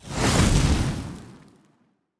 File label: Fire Spell, Magic Sounds; Fantasy Sounds Bundle